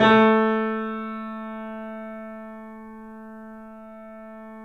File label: A -R, The Piano; InVision Interactive - The Piano